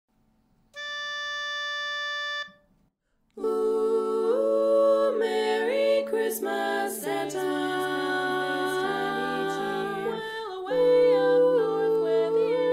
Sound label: tenor